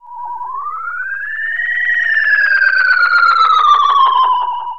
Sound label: Tape Delay